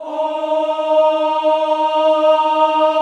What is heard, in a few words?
CHO ES3, CHO Ooh; Sound & Vision; Gigapack I CD 2